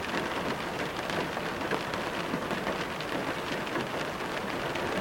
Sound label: Car Rain Fall